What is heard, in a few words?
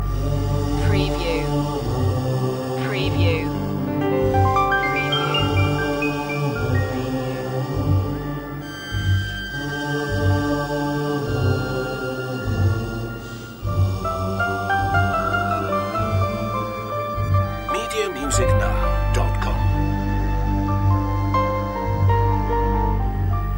monks in the dark loop